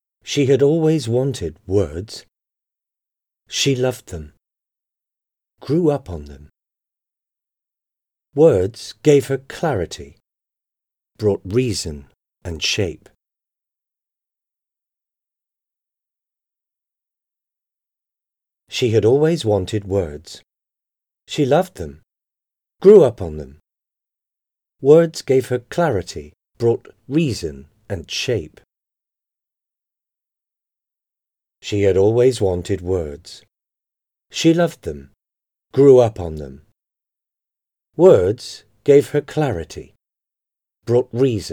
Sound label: Marching Band Show; DDCHS Band of Pride